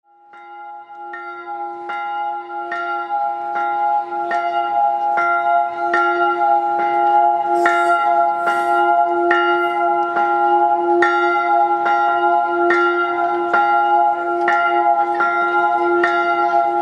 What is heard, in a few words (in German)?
Glockengeläut Chorweiler Friedensglocke